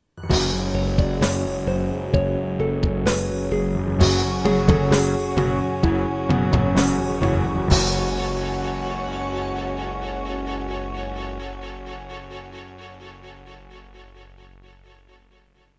Doom (Sigil) - Introduction [Procomp Pro-Multimedia]